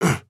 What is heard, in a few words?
hurt